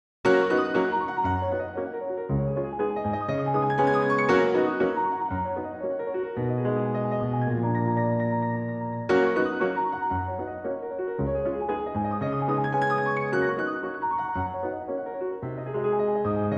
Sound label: Etude in Gb major; Etude Op. 10 No. 5; Black Key